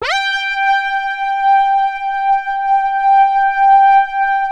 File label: P5 WRP G4, PROPHET 5; Greytsounds VOL-1